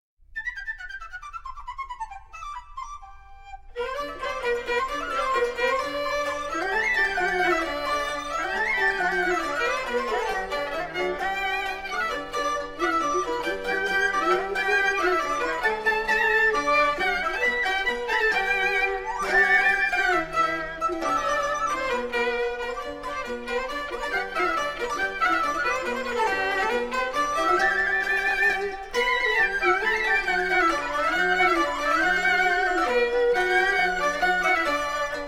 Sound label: Cantonese Music Ensemble; CHINA